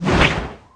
Whoosh

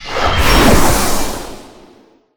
Wind Walk

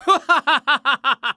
HAHAHA

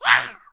ball red

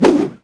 Weapons Swing

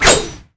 SWORD

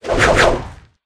Whoosh

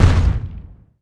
HIT big body

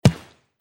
Kick

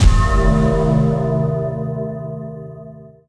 Mana Concentration

